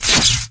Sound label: shock impact; HeavenStudioPlus; trickClass